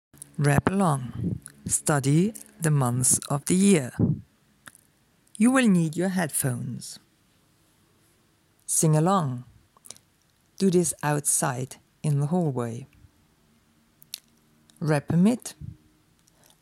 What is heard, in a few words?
months rap